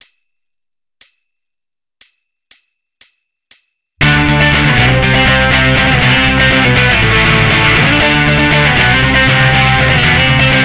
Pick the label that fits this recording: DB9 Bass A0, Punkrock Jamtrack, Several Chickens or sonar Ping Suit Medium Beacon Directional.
Punkrock Jamtrack